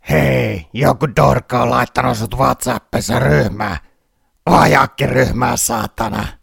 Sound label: Groupmessage